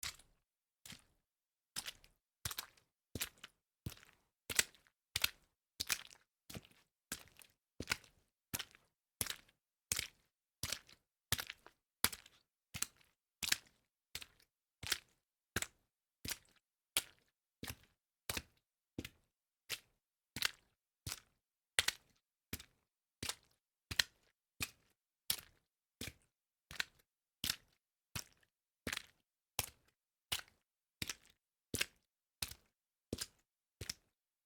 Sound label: water walk series sweetener